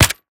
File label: 9a91 fire; STALKER